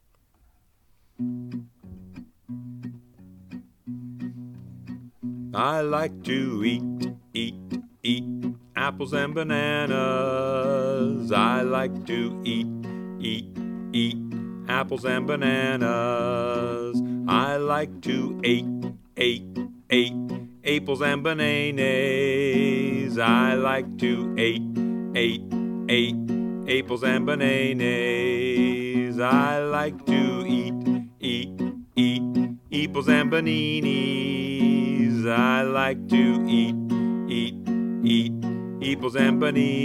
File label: Apples and Bananas Guitar